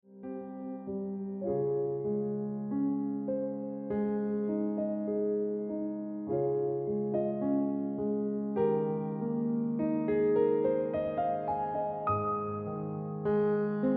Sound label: Ringtone Piano Music